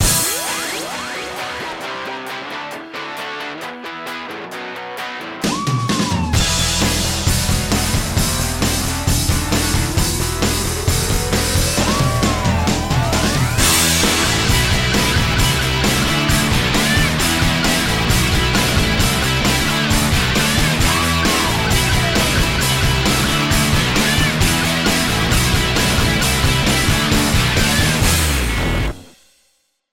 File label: stock music